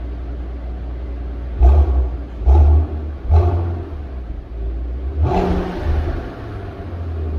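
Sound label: Maybach, exhaust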